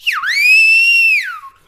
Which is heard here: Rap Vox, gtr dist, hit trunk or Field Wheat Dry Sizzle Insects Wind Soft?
Rap Vox